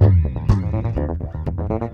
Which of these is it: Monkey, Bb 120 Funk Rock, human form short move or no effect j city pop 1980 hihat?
Bb 120 Funk Rock